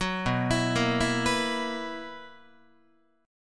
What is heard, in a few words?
B Major 7th Suspended 4th B, Guitar; arpeggiated